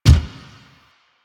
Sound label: Gear Shift Up, Motorcycle; Motorcycle Ninety Nine